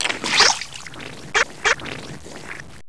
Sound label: Dolphin; PC; MARIOTEACHESTYPING2